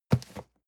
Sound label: cardboard box medium grab; objekte